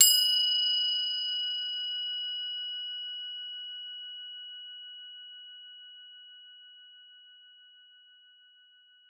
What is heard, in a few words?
CRO F#4, CROTALES; Xsample VOL-4